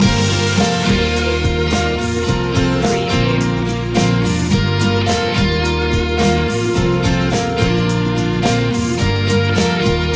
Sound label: brighter day loop